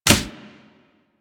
Melee Fist Impact Generic; Warframe; Weapons; Fists

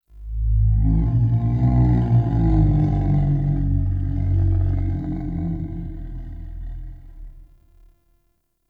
Root Cave Ancient Tree Groan; Ambience; One Offs; Neathuns Root Cave

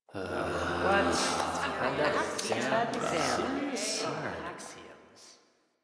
Student Groan; Cinematics; Warframe; The New War; Zariman

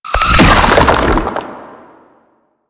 Octopede Horrors Charge

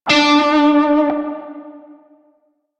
Shawzin Flourish Oct Three D; Music; Warframe; Narmer Shawzin

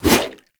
Sword Swipe, Whooshes; Weapons; Melee; Warframe; Pacifist Sword And Board

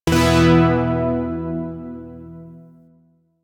Shawzin Chromatic Chord; Zariman Shawzin

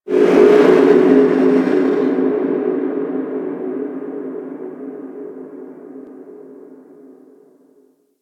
Moon Creature Large, Ambience; Warframe; Orokin

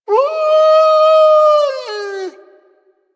Kubrow Player Lure Call; Venus; Animal Lure; Legendary

